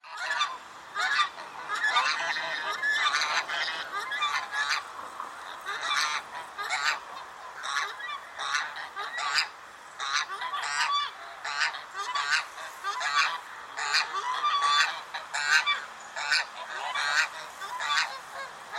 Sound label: Swan goose